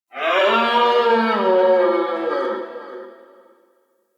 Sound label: stag stampede vocal; Powers; Stag Pact